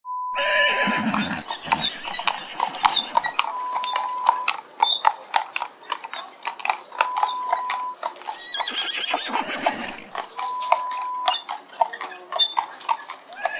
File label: Horse drawn carriage